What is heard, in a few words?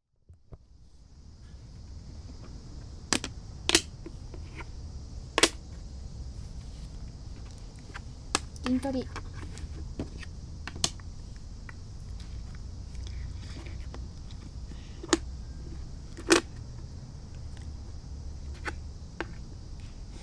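playing shogi; shougiwoutu; kikushima; Chino Cultural Complex ARCHIVES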